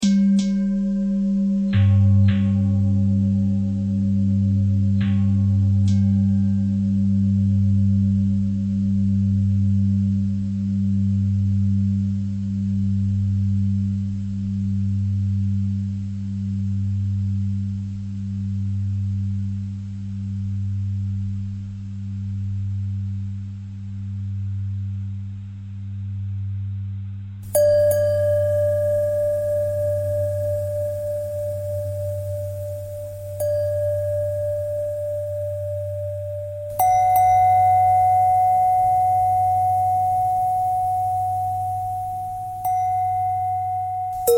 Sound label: bell threads